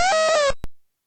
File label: death